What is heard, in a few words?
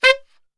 C 3 SAX SHOT, Brass Super Section; Best Service